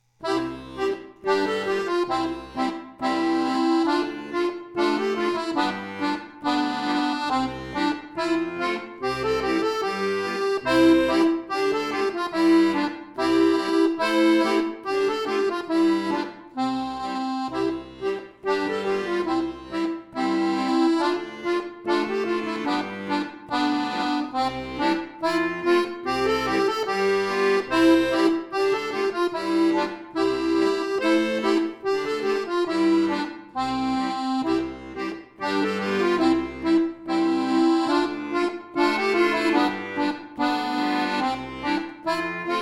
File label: Lasst uns froh und munter sein leicht, Accordion Solo; Fröhliches Lied zum Nikolaus-Tag, leicht gesetzt für Akkordeon solo